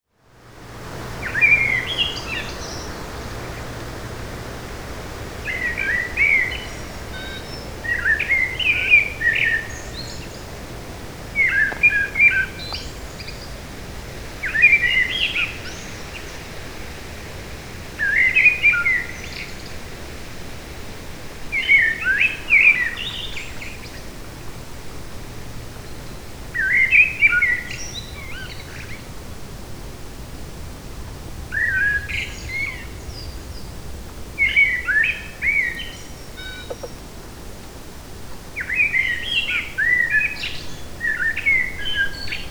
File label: blackbird forest